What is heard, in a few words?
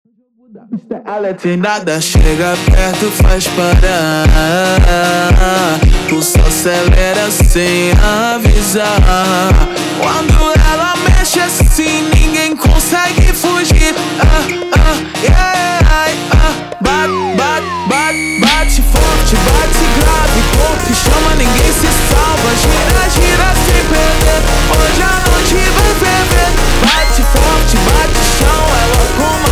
Montagem super Slowed; فانک